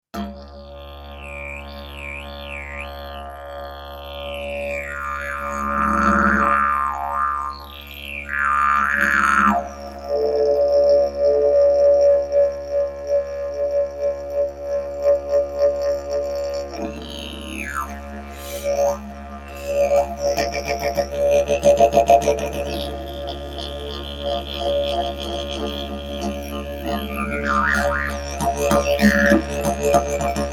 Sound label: didge